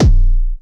kicks, Bass Drum

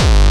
Bombo Hardcore, kicks

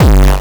Badrum, kicks; Singlesamples Nu Style Kickdrums

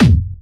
Harder, kicks

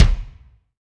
SR16 Kik Room Flab, Bass Drum - Kicks

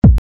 kick

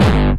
bombo, kicks, Hardcore

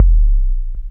kick

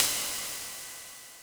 CYMBAL, Yamaha RM 50

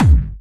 Medium, kicks

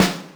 snaredrum, Yamaha RM 50

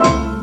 Piano Hit; one shot A

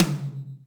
Yamaha RM 50 Toms

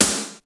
Splat sd, Roland JD-990; Drum Samples

